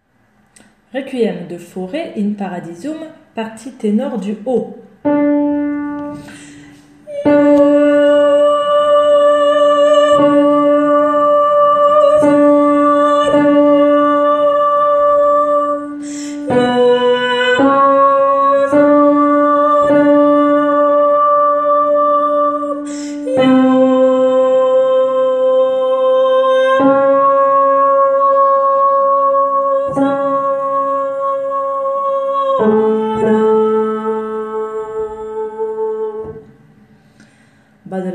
Ténor haut; In Paradisum

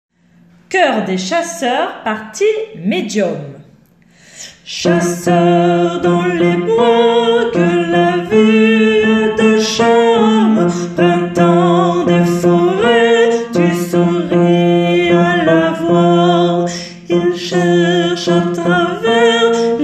CDC medium

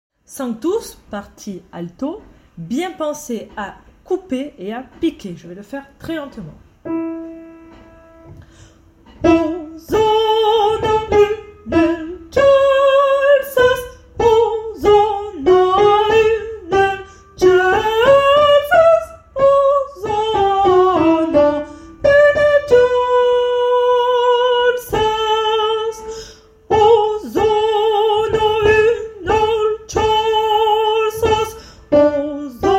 alto lent; Sanctus p28

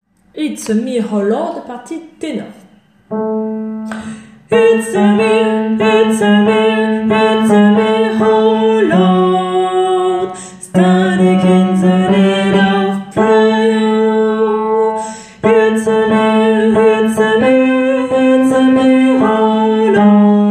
Ténor

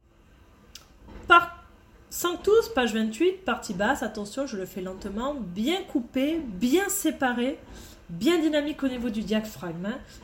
basse lent; Sanctus p28

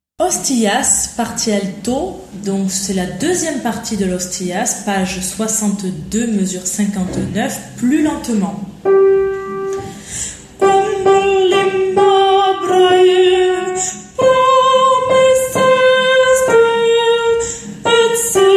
Alto lent; Requiem; Hostias; Mozart

